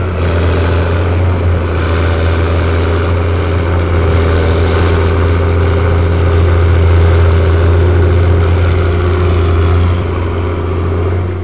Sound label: buzz